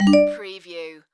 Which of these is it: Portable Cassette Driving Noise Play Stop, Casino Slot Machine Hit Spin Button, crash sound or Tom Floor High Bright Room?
Casino Slot Machine Hit Spin Button